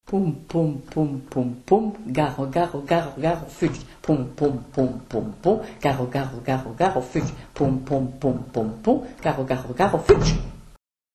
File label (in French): Poum poum gare gare comptine occitane